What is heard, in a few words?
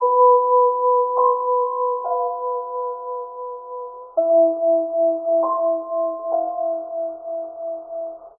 vibes and piano slow burn, Loops, Sad